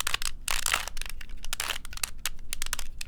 Small Cube - Twist, Rubik's Cube

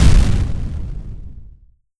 explosion; NIIIEMAND's explosion sfx

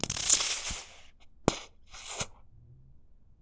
Plop Viscous, Bottle Plops

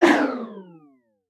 synth misc, retro synth; retro synth SFX

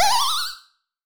Creature small; Free SFX for anything

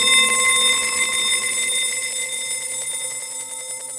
Phone ring; FREE SFX PACK VOL 1

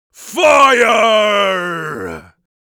fire, Mage Voice Pack

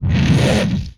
Swipe; MMRetroArcadeSoundsPack

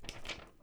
paper

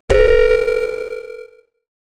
Retro Alarm; Alarms Blip Beeps; GameSFX

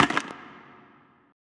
UI Open; FREE SFX PACK VOL 3

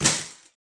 falling, breaking falling hit

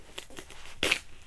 Rip and Tear; Micro Pack

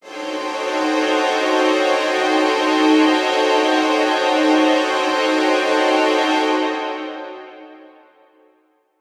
Suspense Strings High, horror strings

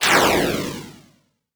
Retro Missile Launcher; Weapon

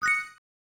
Retro Event Acute

